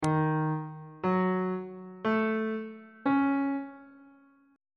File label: d sharp locrian 7th chord 1 is d sharp half diminished 7th